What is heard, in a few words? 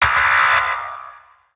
security bot alert; gow; fortifications